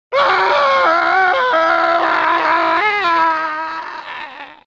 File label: crashed scream; deception; shujinko